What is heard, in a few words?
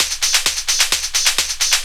130 addon, DRUMLOOPS, HIHAT